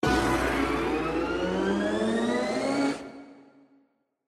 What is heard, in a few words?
Anticipation Scatter; Lotus Garden